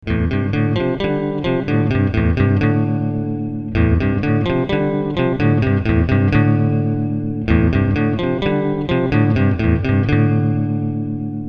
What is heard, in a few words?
Octave Reverb; reverb time; pitch; reverb level; All recordings are direct line - instrument + OCTO-FX + audio interface = mp3-file